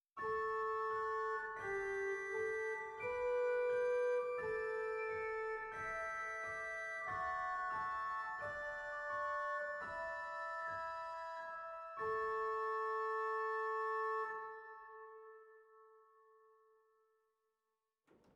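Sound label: Borstwerk Gemshoorn 2, organ; zwolle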